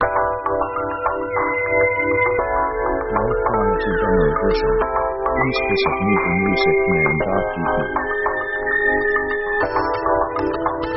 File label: ethnic brasil funk